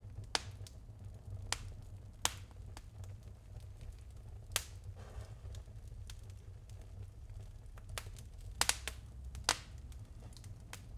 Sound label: Fireplace; Fireplace Tree